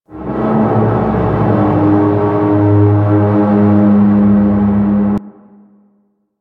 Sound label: Hub Tonal One Off; Steel Meridian; Warframe; Tenno